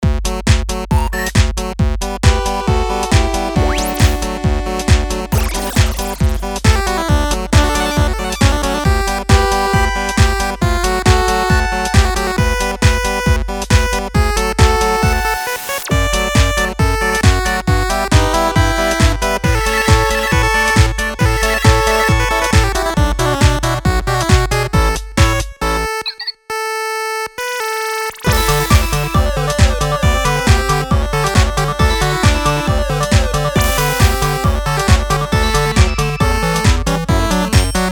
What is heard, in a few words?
8bit Chiptune; Amadeus